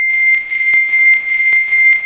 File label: ringing C2